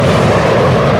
Flame Thrower

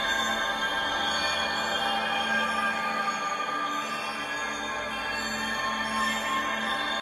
Healing Potion Loop

